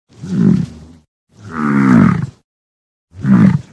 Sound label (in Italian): bison